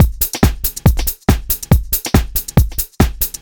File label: Kick Loop, 140bpm